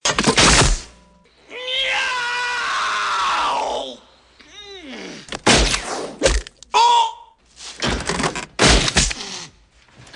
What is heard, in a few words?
Nail Gun